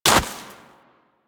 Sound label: Walk Snow, Footsteps; Warframe; Operator